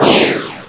COOL EXPL; cool spot

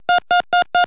LOCKING

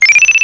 beeps; PUZMANIA

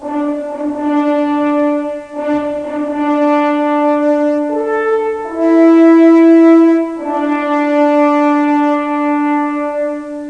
horn call; Classique

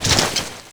impact

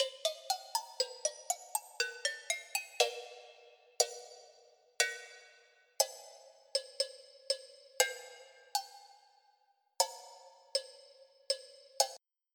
Cha Cha Bell

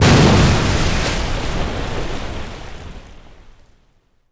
water splash

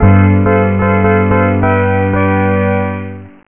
Win Level; DreamLight Verttice™ 1.1

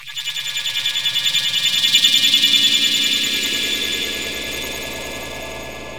D+B 160; eJay

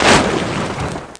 explos; AB3DII

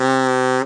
BUZZER

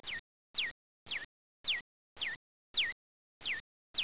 bird; 12000 Graphics Pack - A Complete Graphics Collection For Your PC

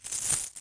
coins; DAoC; Toa

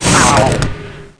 pain; QUAKE2; megaman

